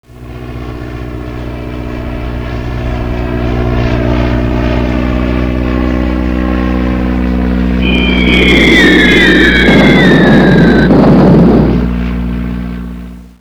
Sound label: flyby